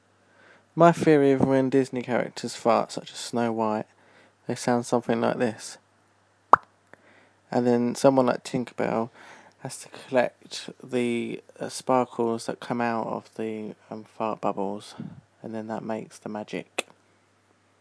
fart

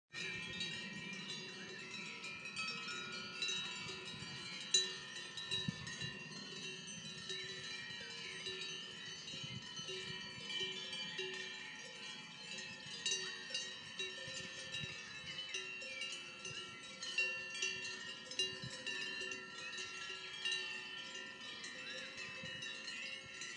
cow glocks

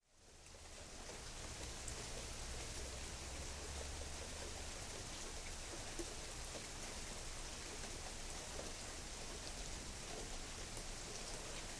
pouring rain in regina canada

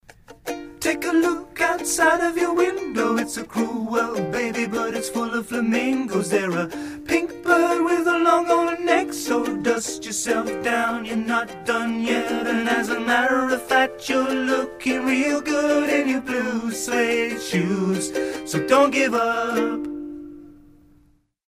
short song

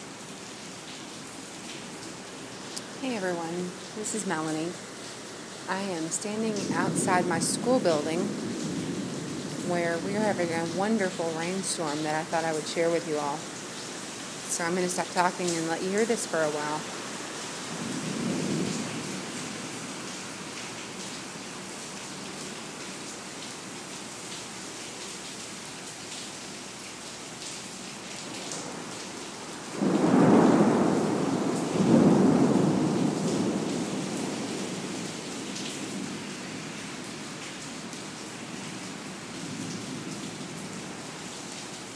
thunderstorm

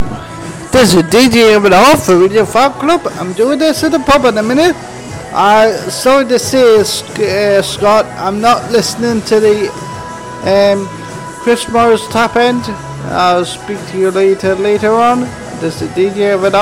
pub

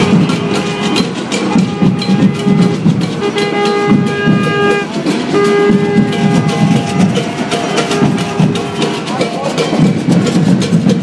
Notting Hill band

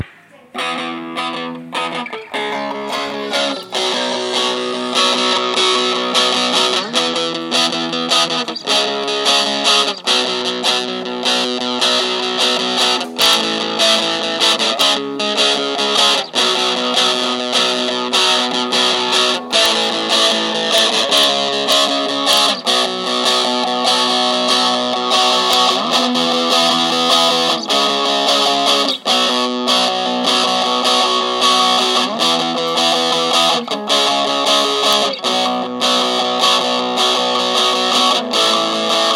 Dano guitar

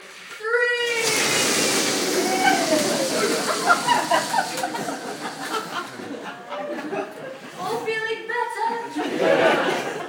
ballooning

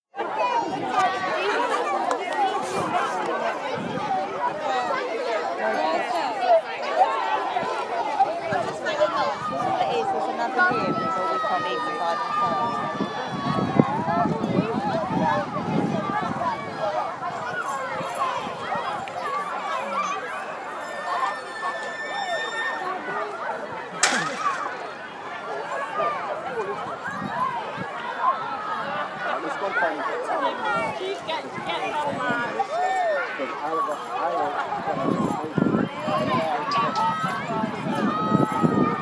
sportsday park background noise; westow park

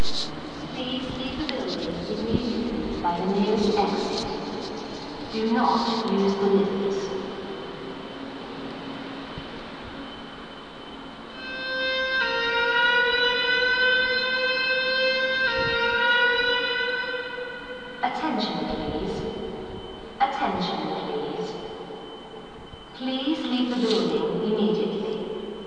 fire alarm featuring clarinet solo; Kings Place

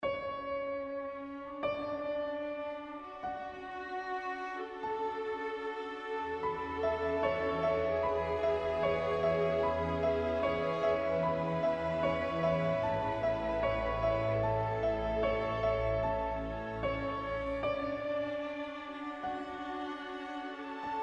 strings piano doodle

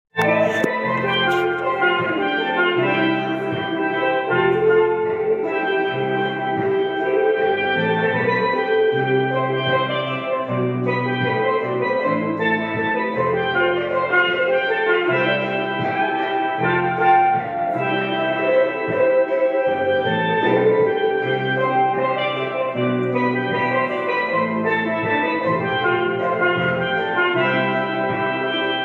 steel pan workshop

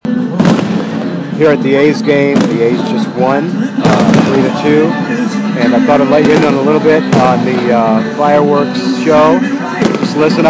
Fireworks

